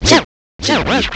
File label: SCRATCH; CMI Sound Library Rev 1.3